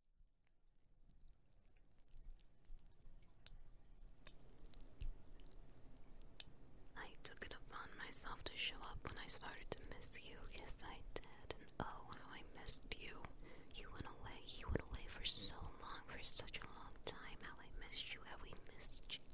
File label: All Wet Noises; Empty for Too Long